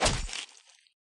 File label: saber hit; minecraft